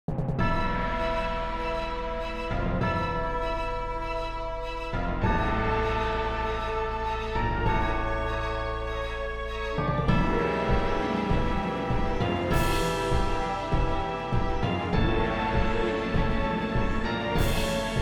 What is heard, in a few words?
Event Suspense Heavy; Edens Zero; Xbox Series X/S